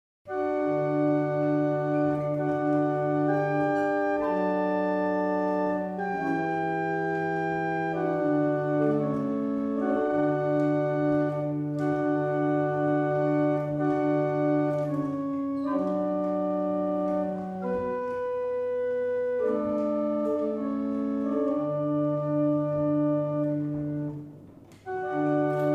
Metrical chant, barrel organ; Winwick; Troyte 1

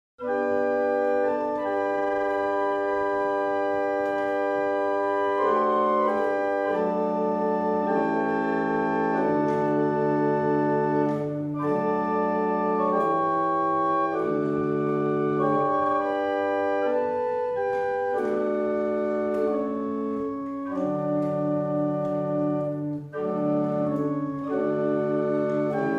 Morning Hymn, barrel organs; Winwick

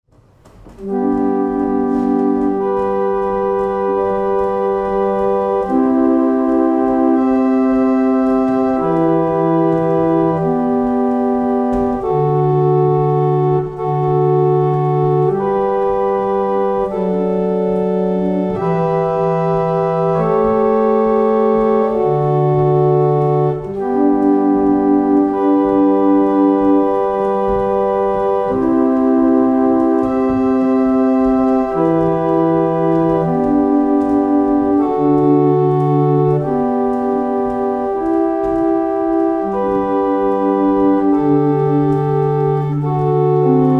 barrel organ; Letheringsett

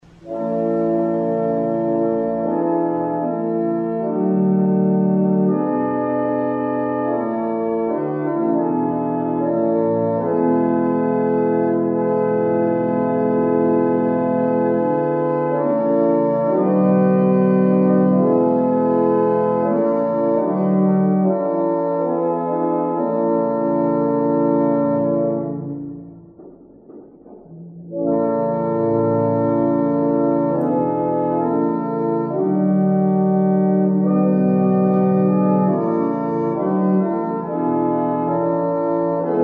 Chant, barrel organs; Barnston; Dupuis

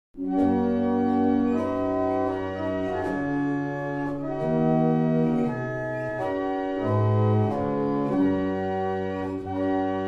Double chant, barrel organs; Bressingham

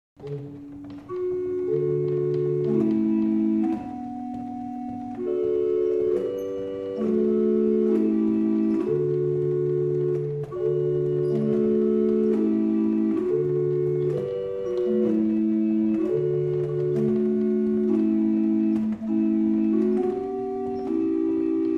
barrel organ; Liddington

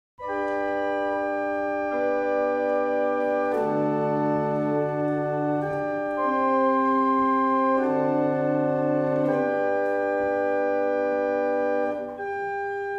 German Hymn, barrel organ; Winwick

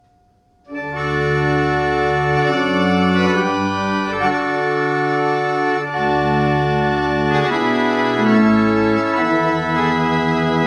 Double Chant, barrel organs; Robinson

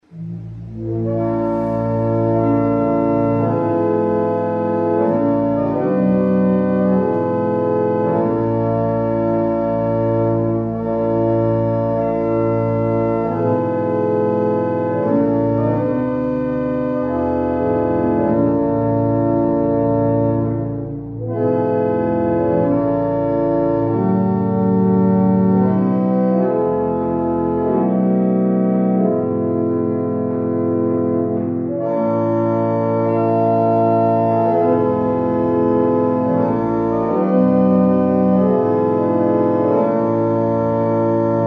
German Hymn, barrel organ; Barnston

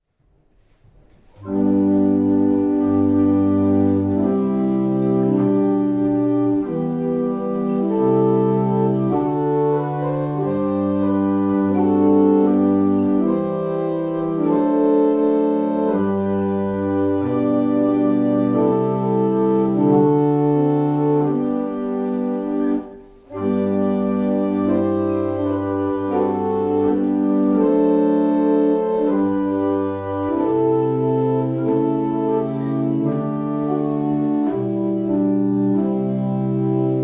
Evening Hymn, barrel organs; Steeple

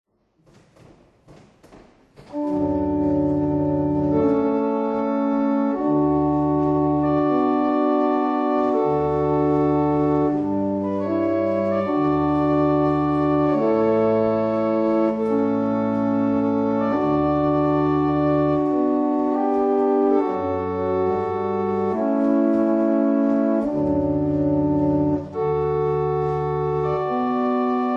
barrel organ; Letheringsett

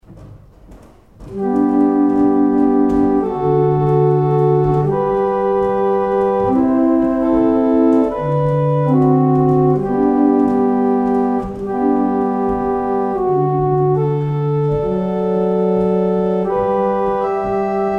barrel organ; Letheringsett

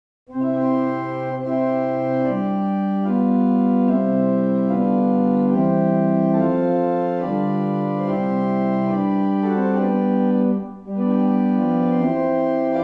barrel organ; Llanfair

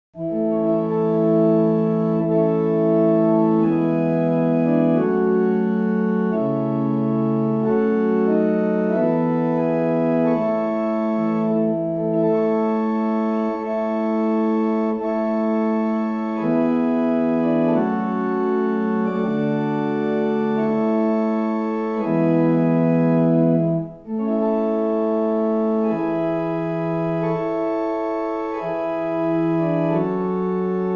barrel organ; Llanfair

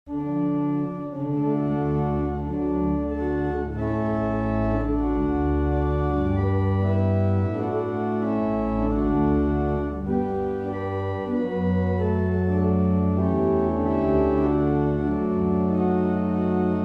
Martins Lane, barrel organs; Meldreth

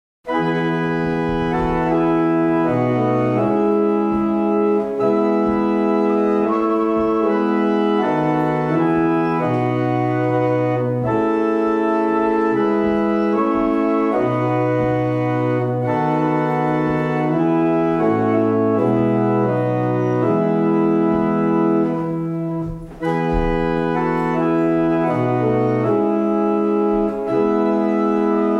Double chant, barrel organ; Muchelney

